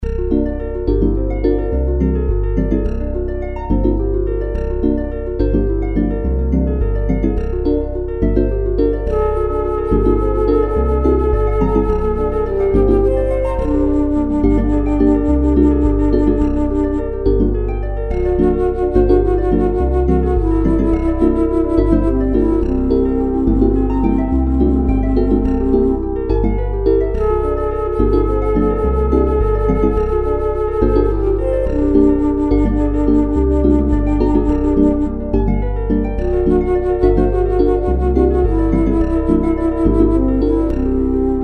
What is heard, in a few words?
Trumpet Song